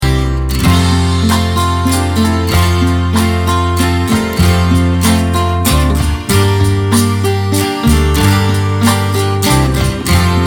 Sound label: choir; Away in a manger